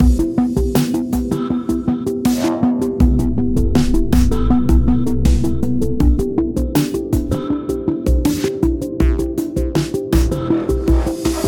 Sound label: Mystery Music Loop